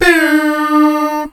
airhorn

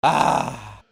aaah

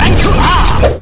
rank up

